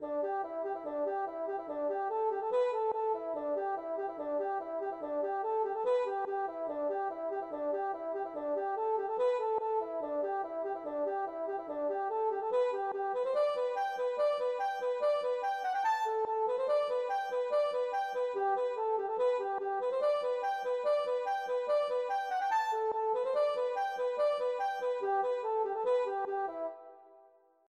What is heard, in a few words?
polka